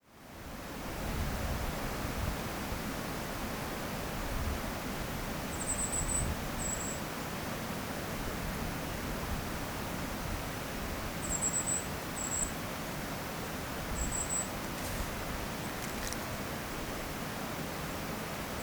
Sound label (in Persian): باران; Water sound